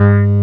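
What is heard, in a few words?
SUSTAIN BASS G3, SYNTHS; OBMX-SYNTHS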